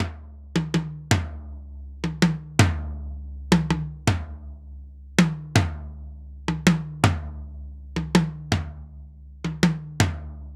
toms, Drum Tracks, 081 Cmin

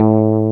BASS D2; AKAI S-Series CD-ROM Sound Library VOL-8

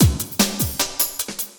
LOOP, Drum & Bass, 150 BPM

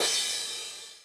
909 Crash Accent Min Decay; classic machines

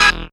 syn e, Dance Synths Stabs & Hooks; vol.37 - Dance Synths

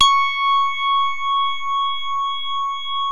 JAZZ MID C5, E-PIANO; Music Store

